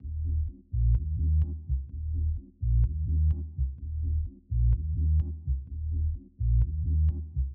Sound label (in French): basse